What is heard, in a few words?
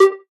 pong ball single; Pitch Black Audio Pong